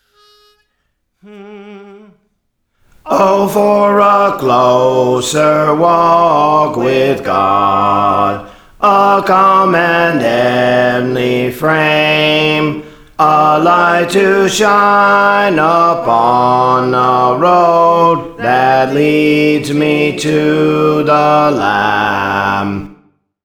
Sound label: Bass; Praise For The Lord Training; O for a Closer Walk with God